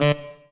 SHORT SPIT; Greytsounds - Scott Peers Sound Engineering

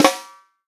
snare drum, POP SNARE CHROMATIC; Best Service ProSamples vol.43 - Real Drum Kits

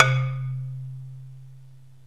MARIMBA VIBES

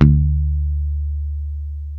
HIPH D 3, Bass; Spectrasonics; Bass Legends CD 1

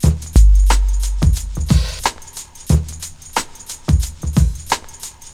LOOP9 R, Drum Loops; Big Fish Audio; Prosonus 500 Drum Loops

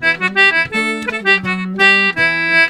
C4 R, Celtic Flavours; USB Soundscan vol.42; AKAI

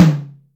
Dry Mid, Acoustic Tom; Yamaha DTXpress

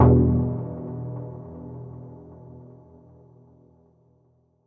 stab delayer F, Noise; stabs

